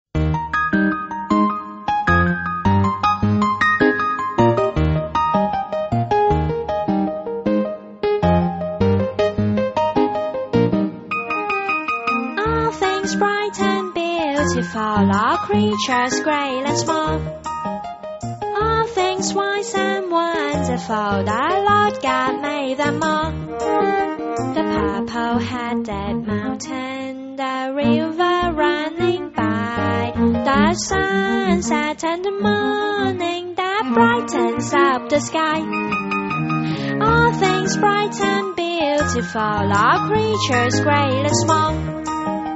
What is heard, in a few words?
beat